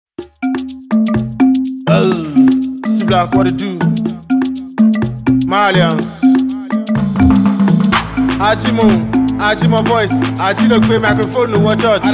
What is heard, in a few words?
Drums